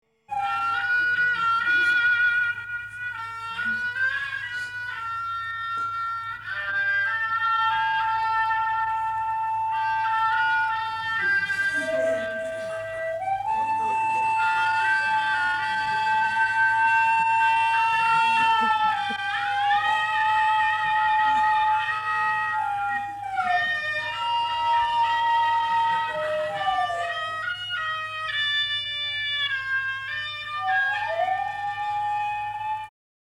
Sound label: Megaphone Half-Sized Violin And Amplified Right Leg